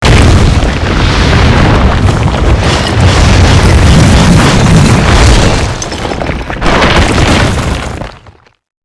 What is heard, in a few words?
golem windmill